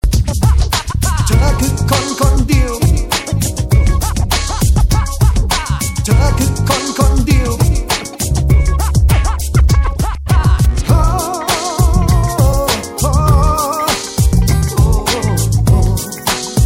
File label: Dedicated Clubmix